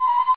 flower release; tuxpaint; magic

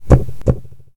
Heart beat; memory-sound; gcompris-qt

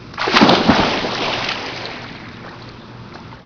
big pool splash

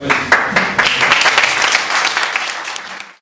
Applauses; memory-sound; gcompris-qt

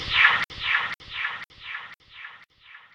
kaleidoscope; tuxpaint; magic

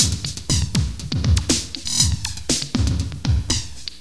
electro beat; lmms

